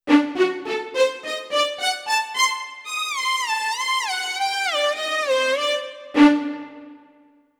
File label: Watariyat Lead